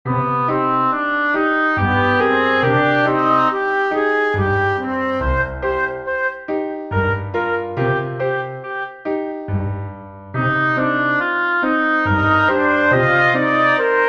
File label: pno